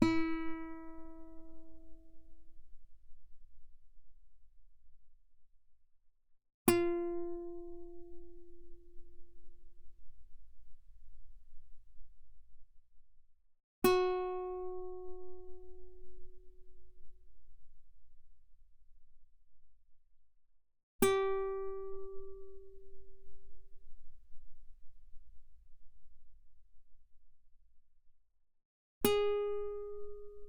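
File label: Guitar fortissimo sul E E4 B4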